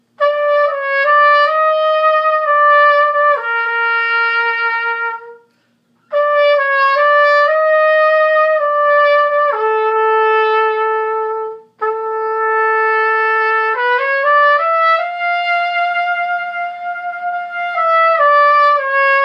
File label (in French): Trompette; Le Parrain